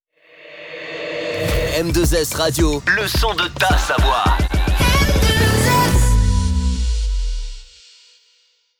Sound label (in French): Chant Theme Logo